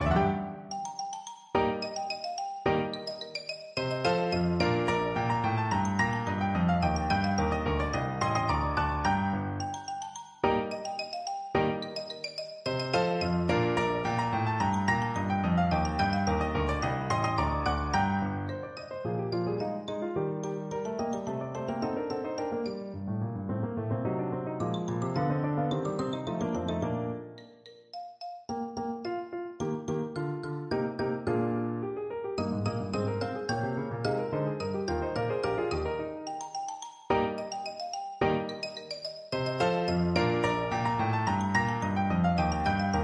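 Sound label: xylophone, percussion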